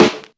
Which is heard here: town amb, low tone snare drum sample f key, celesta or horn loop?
low tone snare drum sample f key